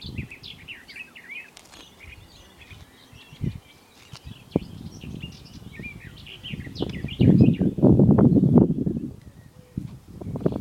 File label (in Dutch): Tuinfluiter; Oostvaarderplassen